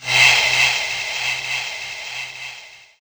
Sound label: Fx Vox; Equipped Music; Loungin House